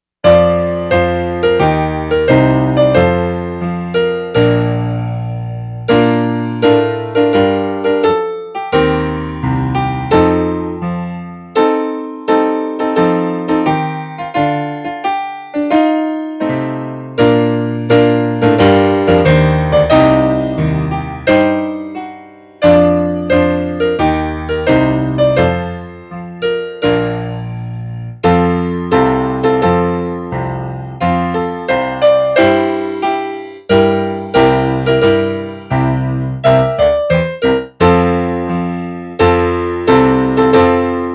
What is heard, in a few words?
piano